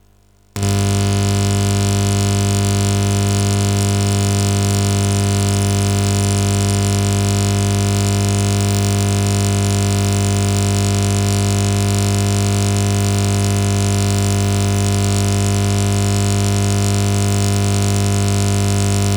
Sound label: ground loop; turntable record player